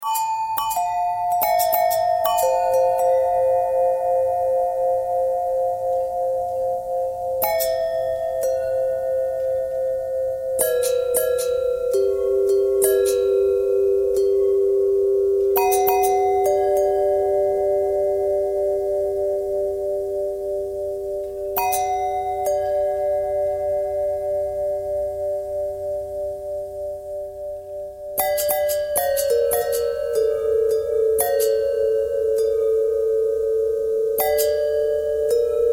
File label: long bell long bell bend threads, bells